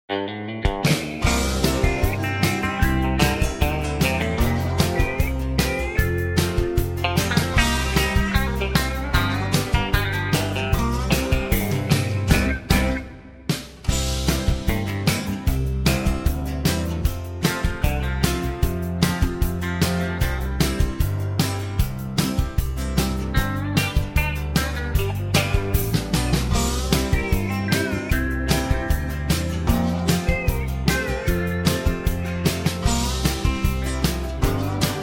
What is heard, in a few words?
Hello Heart (C) no backing vocals